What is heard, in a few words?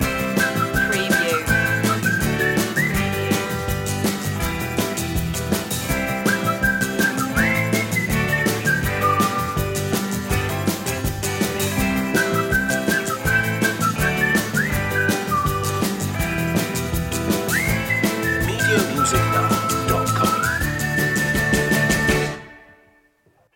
upbeatful loop